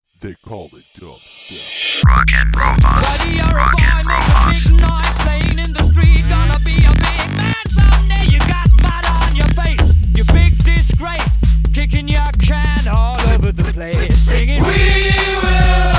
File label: dubstep